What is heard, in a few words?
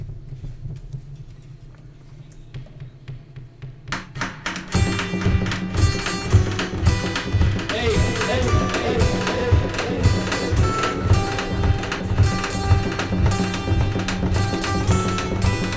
Jungle Beat (Interlude)